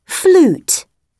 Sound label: flute; phonics